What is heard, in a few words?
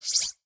sci-fi sfx